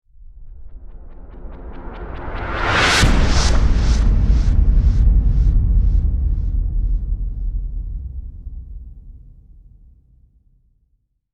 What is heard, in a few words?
Impact Stinger, Whoosh with impact trailer stinger.; Stingers; Sound Design